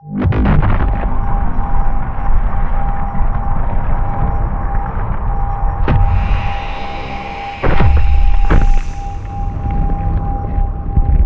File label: colossus closing; necroid; Stellaris; necroids